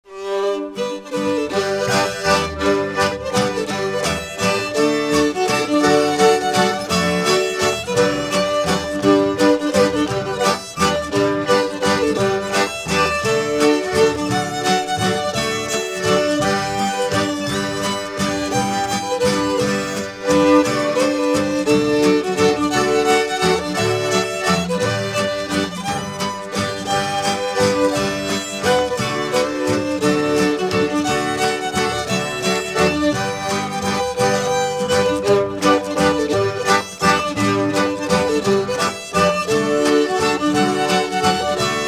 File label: Waltz